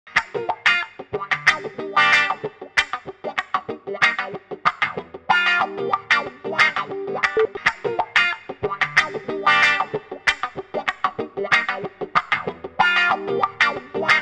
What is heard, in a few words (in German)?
Gitarre unkomprimiert komprimiert; Dave Hill Designs Titan